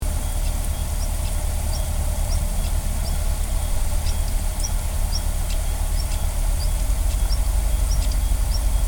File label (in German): schwarzkehlchen